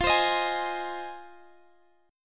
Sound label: F Minor 7th F Guitar Half Step Down